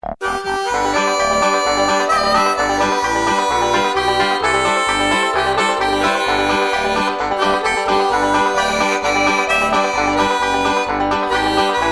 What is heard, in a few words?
Variation Bluegrass; Variation 2